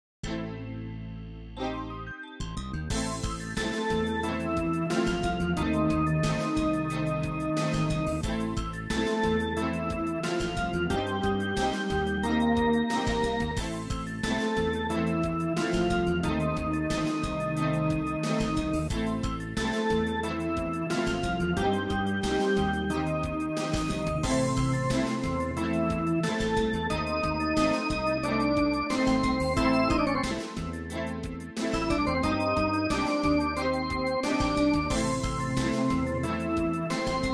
MIDI Dungeon

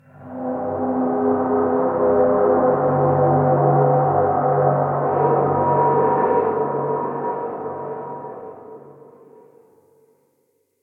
Combat Horror; singularity ps3 windows xbox 360 gamerip 2010

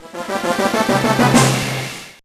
Unused Jingle; Ice Age; GBA

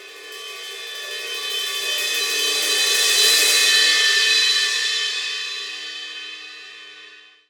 Hi Swell

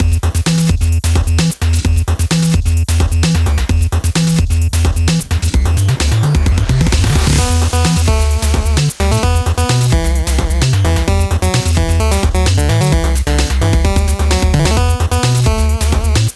minigame music; octogeddon

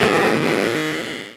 stretch; Birthday Party Bash; Wii; Spanish

